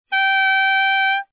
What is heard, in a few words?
A6 alt, saxophone tenor